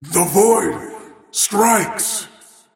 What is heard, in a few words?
attack pain; Void Spirit